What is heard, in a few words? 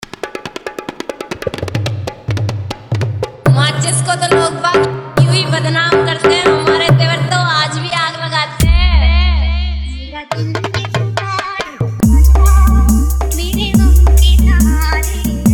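Instra Hard Chhan Chhan Bass Birha Dialogues Dholki Beat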